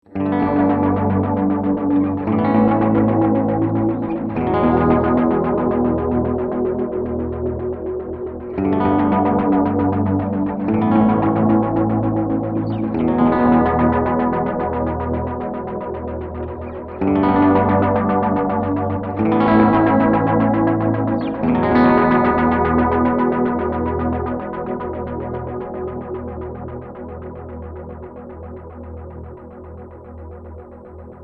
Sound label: filter